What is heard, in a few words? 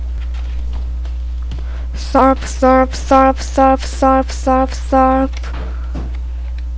surf